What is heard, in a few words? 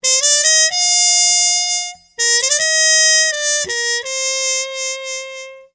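trumpet fx; Musical Instruments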